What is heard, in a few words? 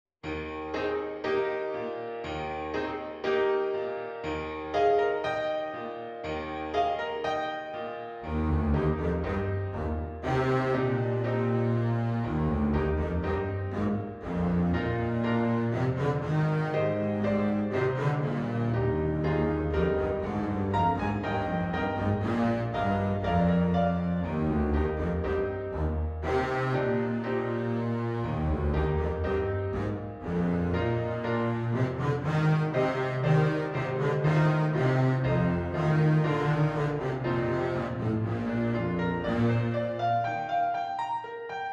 Double Bass; cello